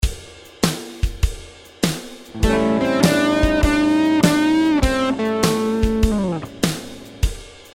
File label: blues note bend; 3 essential notes; better blues solos